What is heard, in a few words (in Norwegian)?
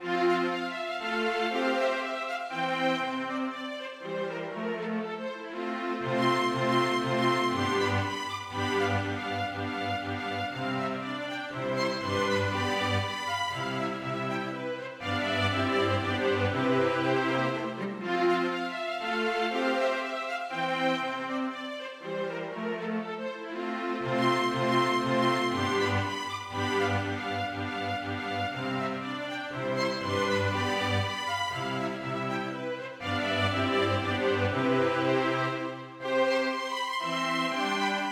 Menuet Alegro tempodi for strykekvintett